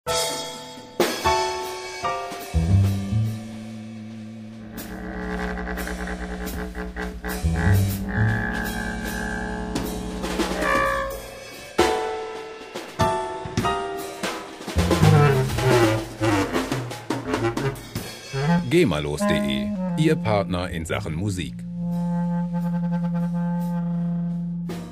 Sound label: freejazz